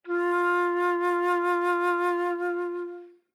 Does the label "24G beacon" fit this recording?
No